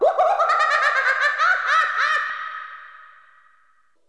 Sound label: rusty bell; unofficial tex murphy